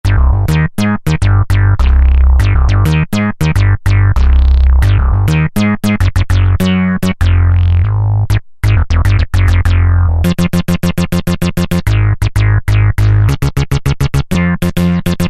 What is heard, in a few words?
Techno Bass, Roland JD-990; Factory Patches